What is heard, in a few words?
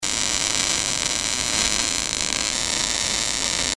short circuiting, welding